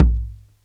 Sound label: Bass Drum Bounce; Lost Tapes From Mars